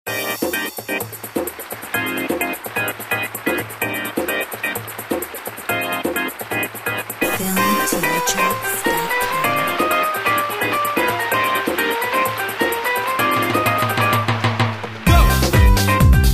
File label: salsa